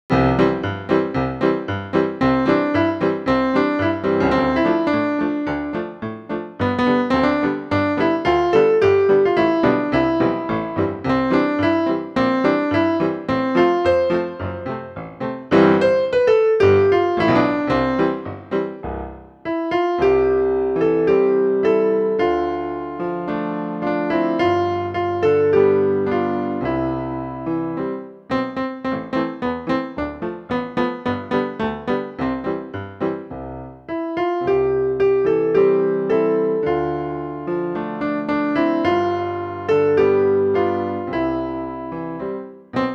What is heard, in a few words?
instrumental